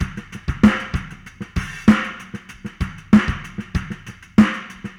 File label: Fast 16 096, Beat Loops; Zero-G; Monster Beats